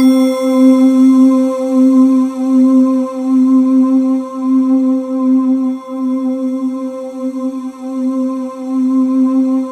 C4, Synth Leads

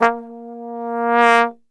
A#1, Brass; Best Service; Brass Super Section

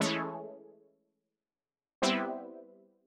120 Stabs A, Keys, Nu Jazz House; PowerFX

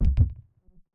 KICK ELECTRO

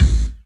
LEDRMK, 3D Drums, LESLIE KICK

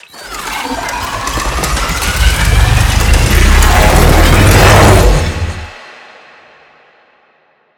machine riser, fx

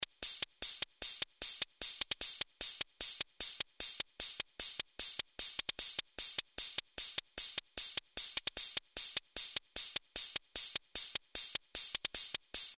Ring Hats; Industrial

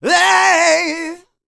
R ROCK, MALE HUMAN; ILIO; Vocal Planet

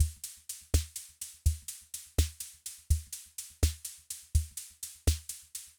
Loop, rhythm king; classic machines

